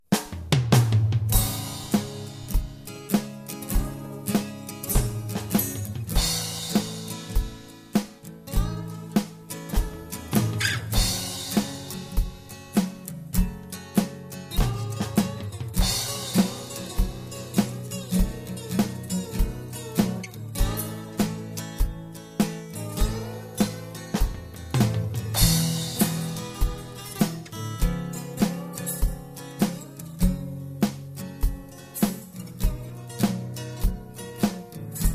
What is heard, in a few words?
Blues II